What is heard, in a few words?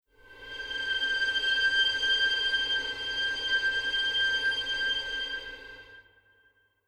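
Strings Harmonics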